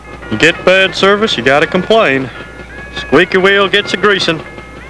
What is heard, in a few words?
squeaky wheel